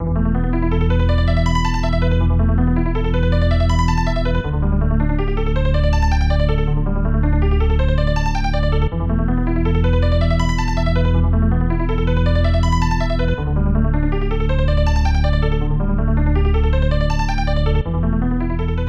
Underwater; Heisei Shin Onigashima; SNES

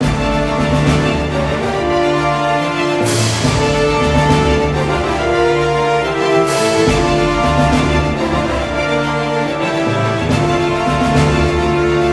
battle

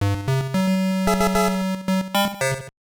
Appear; Hanjuku Hero: Aa, Sekai yo Hanjuku Nare...!!; WonderSwan Color

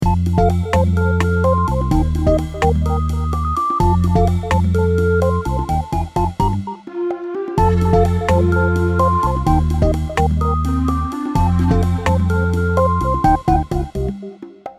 Music Menu; Dream Tower

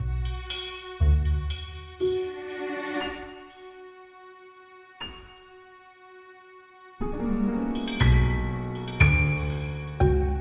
Spooky Ambient LOOP; Crossbow Warrior The Legend of William Tell

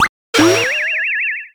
Character Attack

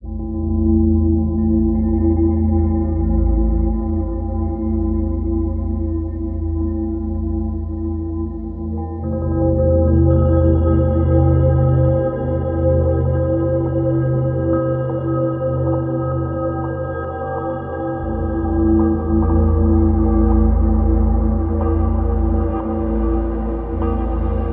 Ambient - Signs; Pools

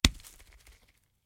Capsulte Impact Debris; Puddle; puddle plus ios gamerip 2018

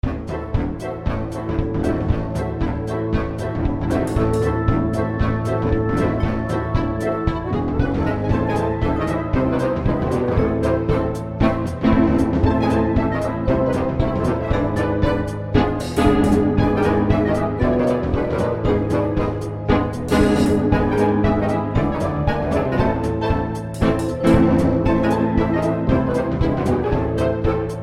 Clarinets

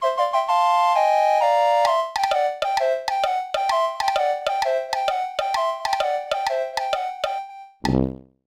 Win - Joke

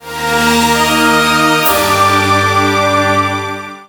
battle victory sting; The Warlock of Firetop Mountain

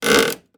footstep wood creak

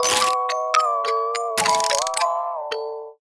Mini Music Man Try Play Music; Five Nights at Freddy's; FNAF Security Breach; Ruin DLC

